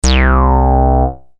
Sweep bass

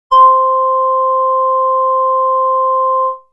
Solar Flute C

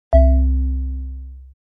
Marimba

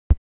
Tristar, synth lead

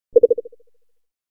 Swamp animal

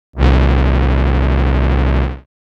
Wide, synthlead

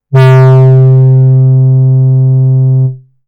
Basic Pulse, synthlead

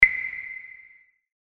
Claves rev; drums

